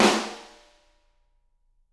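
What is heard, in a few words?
snare drum; Bob Clearmountain Drums I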